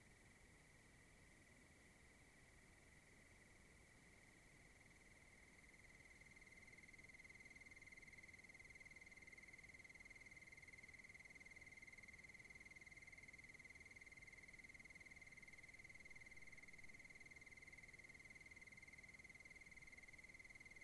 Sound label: Crickets; Wilderness; Oregon; Sutton